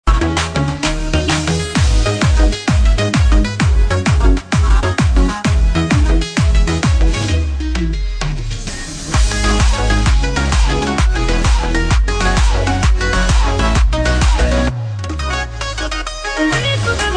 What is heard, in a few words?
upbeat edm